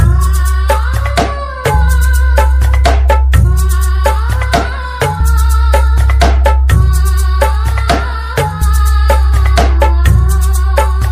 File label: Chhath puja Song Hard Vibration Bass Mix